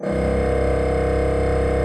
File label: C1, Cathedral Organ, HOLZPRINZ.; Propeller Island